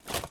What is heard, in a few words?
armor